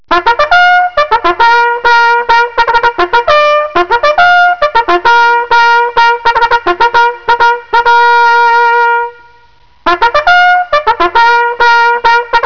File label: sick, bugle; CALL